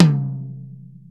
TOM; cubase; CUBASIS; DRUMS

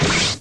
SHOOT; ICEBREAK

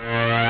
Undo; TileFall

